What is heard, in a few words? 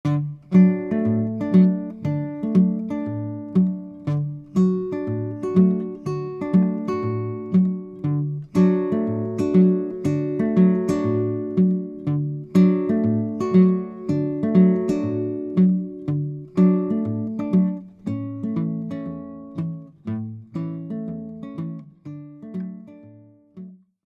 solo